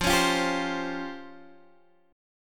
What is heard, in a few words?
C sharp Major Sharp 11th F